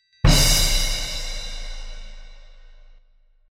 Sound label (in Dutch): harde plof; THUD; Assepoester